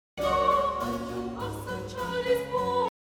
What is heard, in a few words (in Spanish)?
motivo